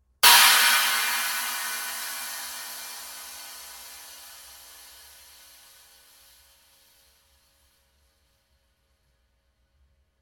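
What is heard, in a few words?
22in Swish Knocker with Rivets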